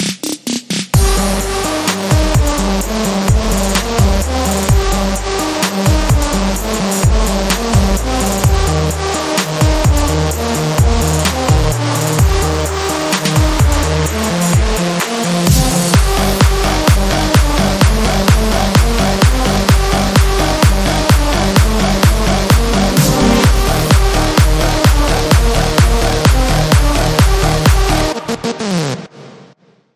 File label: Trap